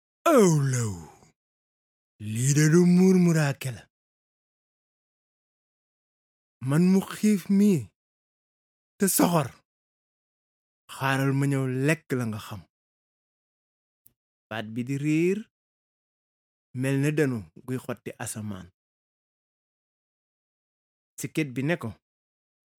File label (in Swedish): bip